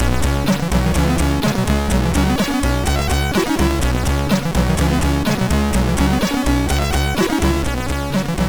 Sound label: sid MOS6581R2; Space Harrier II